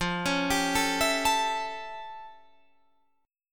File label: F Augmented Major 9th, Guitar, Open D